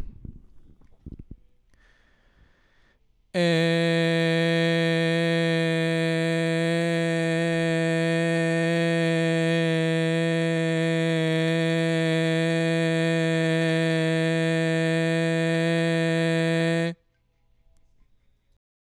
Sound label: Male Ambient Lead Voc